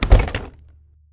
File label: break door; Zomboid 41.78.16; Project Zomboid